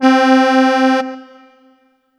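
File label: Kawai K5000W Accordion C4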